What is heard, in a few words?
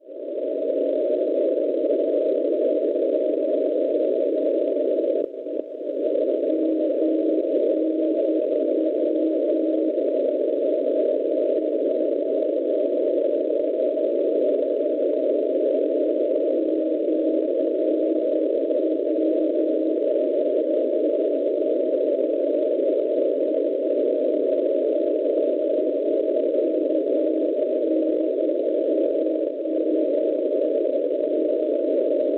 NDB Monaco Germania 338 kHz; MNW; Schwarzsee